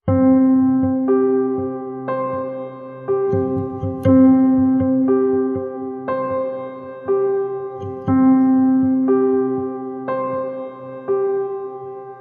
Spark Of Opportunity, No Drums

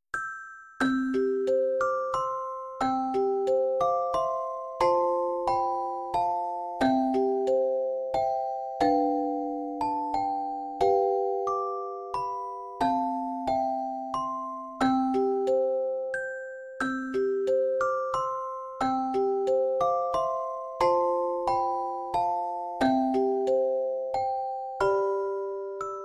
Away In A Manger; Trad (PD)

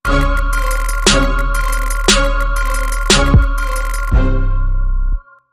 Trap Sting

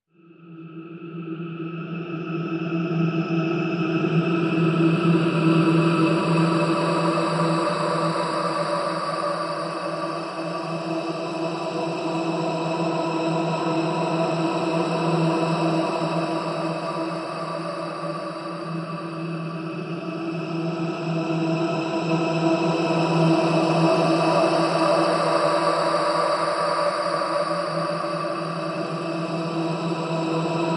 Synth Waves

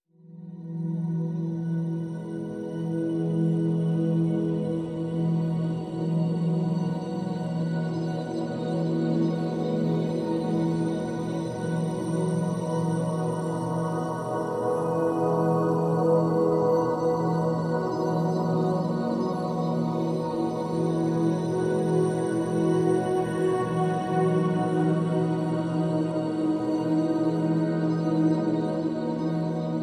No Piano

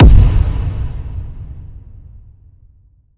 End Zone Kick Hit

